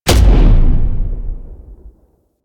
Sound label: Tank Cannon Punch; Enemies; Weapons; Warframe; Lasrian